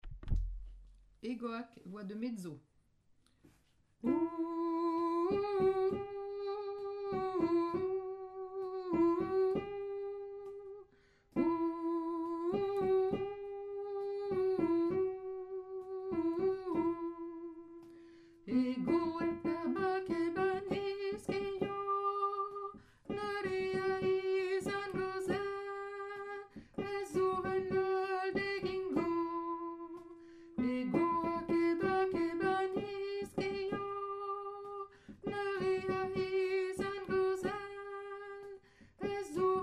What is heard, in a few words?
mezzo; hegoak